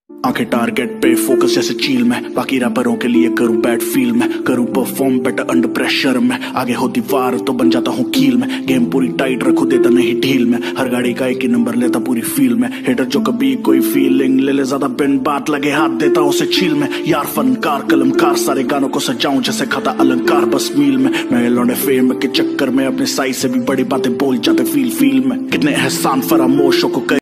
hindi rap ringtone

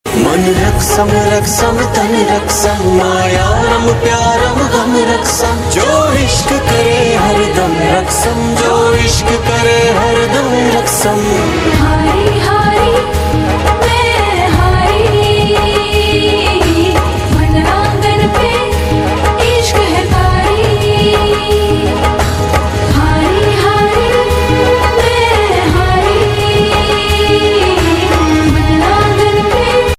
pakistani ringtone

